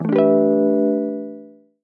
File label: popup